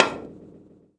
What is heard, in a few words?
cue hit; PC Gamer IT CD 45